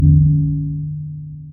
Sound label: Big Bell